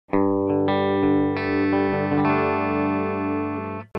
Beyerdynamic M260 Clean (Bridge), guitar

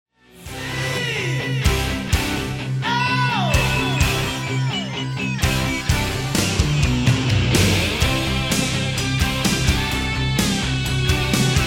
guitar solo section; Lydian

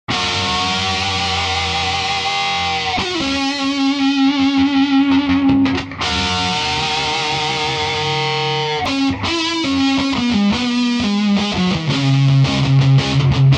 badcat double gain

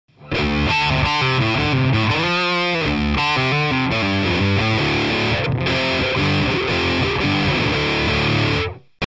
Sennheiser e604 - Gain (Bridge)

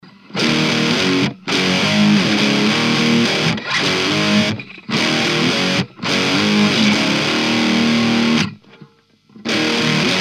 tubeman distortion; reference

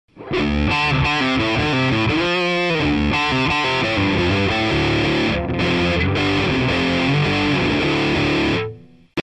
Audio-Technica AT4040 Gain (Bridge), guitar

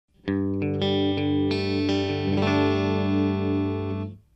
AKG C3000 - Clean (Bridge+Neck)

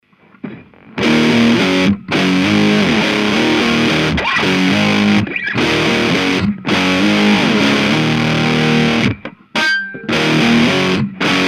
reference x-treme tone distortion

